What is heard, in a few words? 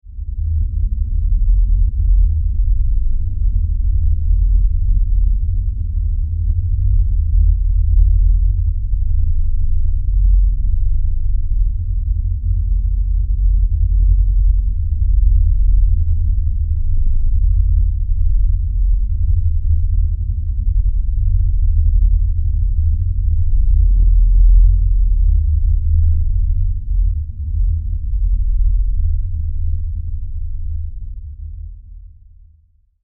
Horror Drone Deep Rumble